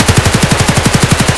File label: rpk16 indoor close loop; Escape from Tarkov